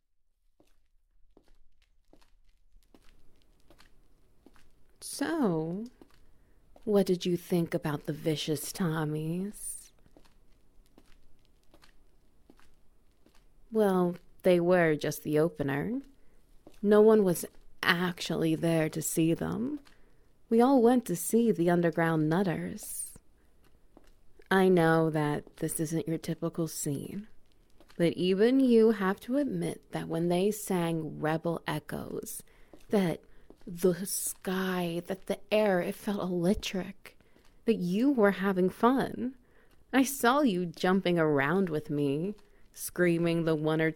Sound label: Wet Noises; The Hyena at the Punk Show